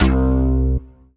Korg 01W Tech Bass C2

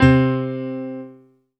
E-Mu Proteus FX Pianotar C3

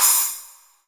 Roland SC-88 Splash Cymbal

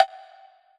Korg N1R High Wood Block

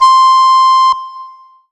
Roland SC-88 Recorder C6

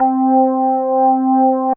Roland JX 03 Organ 1 C4

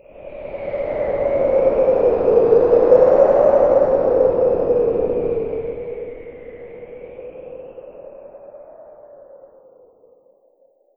Alesis Sanctuary QCard Wind

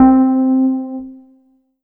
Yamaha TG77 Wurlitzer C4

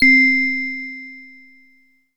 E-Mu Proteus FX Music Box C4

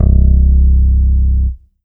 Yamaha TG500 BA Pick C1

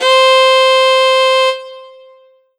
Roland SC-88 Fiddle C5

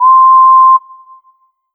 Alesis Fusion Whistle C6

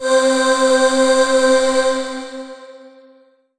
Yamaha SY22 Itopia C4

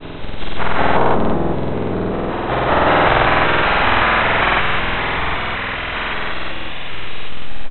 8 Bit Noise

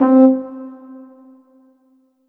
Korg M3R Short Horns C4

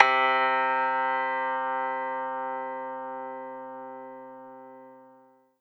Korg TR Rack Radio Piano C3

